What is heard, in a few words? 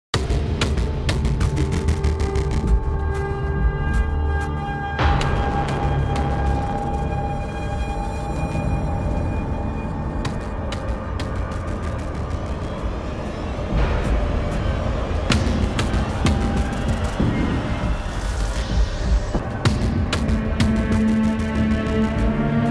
Startled Ambience; Thief 2014 Soundtrack